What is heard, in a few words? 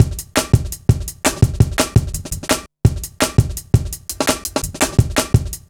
Live Wire, Drum Loops, 168.5, Jungle